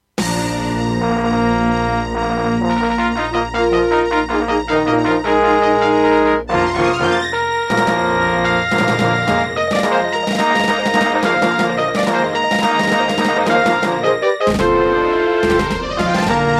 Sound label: Intro, Diamond Monster Sound 0.5MB; Dark Forces